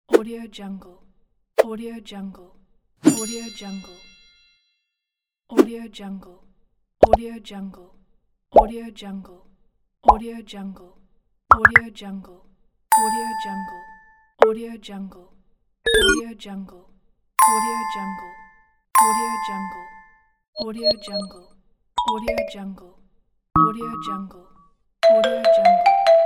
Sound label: motion graphics sound effects pack; medusamusiclab